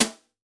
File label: brush, jazz